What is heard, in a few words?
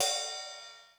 Ride, Korg DSS-1 Drums